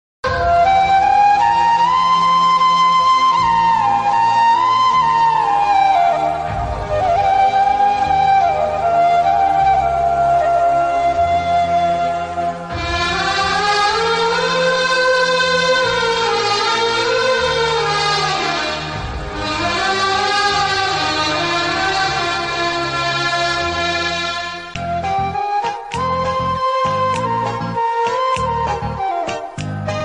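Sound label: emotional love background music ringtone; daddy movie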